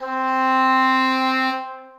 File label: English Horn Solo Legato Vibrato; Ableton; Orchestral Woodwinds